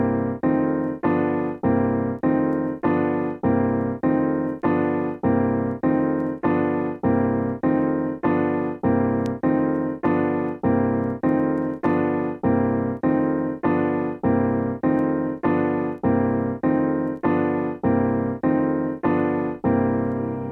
Piano

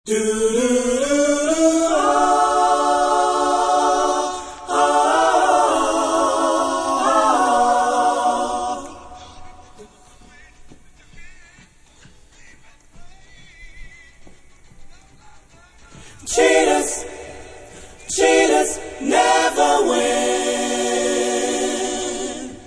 original background vocals acappella

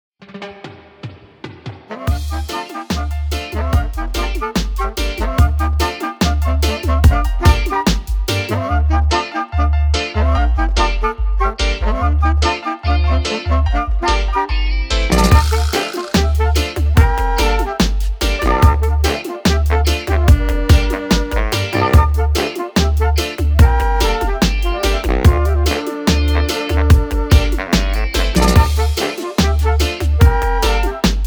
Sax & Flute Cut

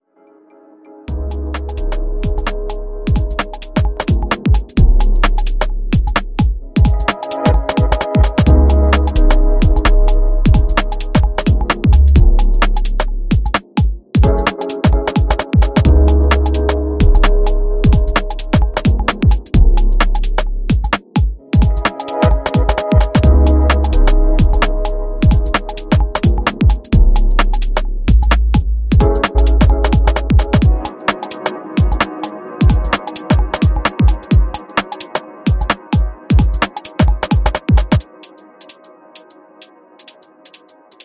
Piano

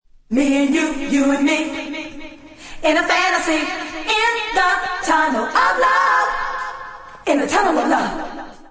(original acappella version)

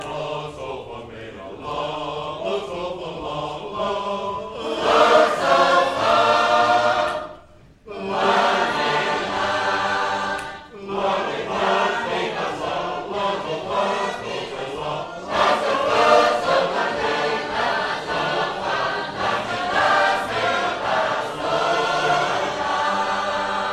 Sacred Harp Singers; David’s Lamentation

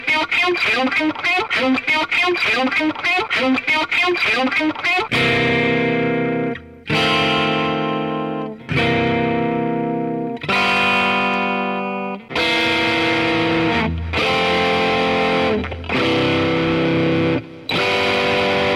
Electric Guitar

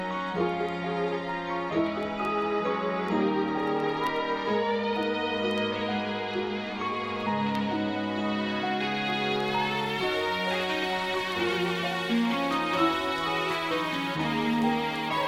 Only Synth In Church

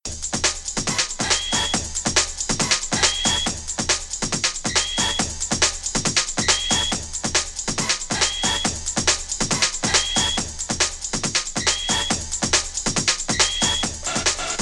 Box Bass

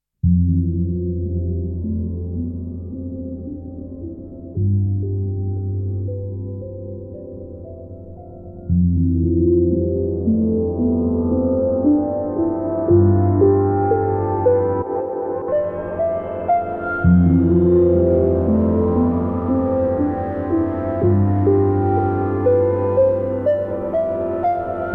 Filtered Grand Piano

